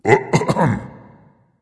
clear throat dragon; fafnir

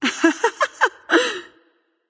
laugh light; smite; voicepack; hel

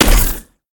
Impact Large; smite; god bakasura

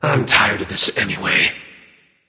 death; smite; agni

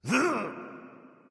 grunt; smite; thor

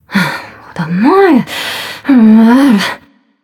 grumble; smite; mulan

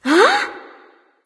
confused; smite; nox

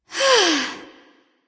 nv sigh; smite; awilix

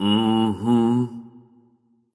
agreement; smite; voicepack; hou yi

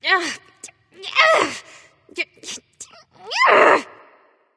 grumble; smite; artio

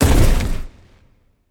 bodyfall; smite; khepri

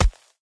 punch ground; Smite; Cabrakan

